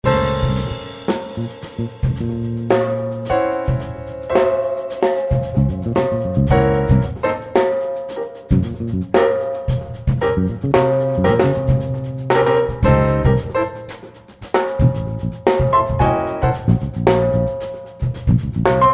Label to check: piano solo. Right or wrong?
Right